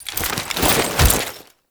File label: Prone To Stand; Lancer; Warframe; Lasrian Soldiers; Foley; Stance